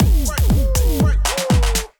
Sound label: Miami Heat Kit groove 120 bpm